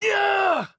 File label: Hurt